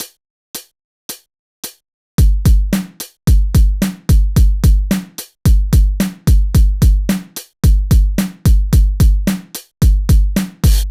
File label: Beat 110bpm, kick variations for guitar practice drum; kick variations for guitar practice drum foundations vol 1